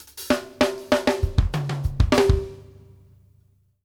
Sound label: OFF HAT, DRUMWORKS FILLS 96